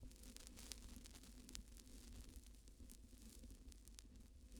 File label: Vinyl Crackles